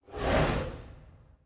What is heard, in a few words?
Monster; Zenless Zone Zero